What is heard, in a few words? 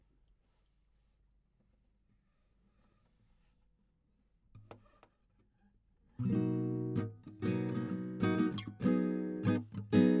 GTR